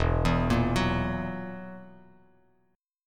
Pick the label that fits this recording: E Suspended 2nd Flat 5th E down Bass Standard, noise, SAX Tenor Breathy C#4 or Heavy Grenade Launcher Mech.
E Suspended 2nd Flat 5th E down Bass Standard